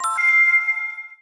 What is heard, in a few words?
email in, phone; HP iPAQ Glisten